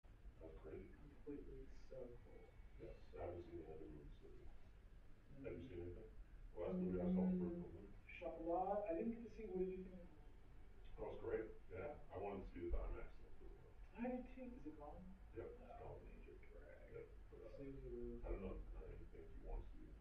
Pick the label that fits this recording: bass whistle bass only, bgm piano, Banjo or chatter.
chatter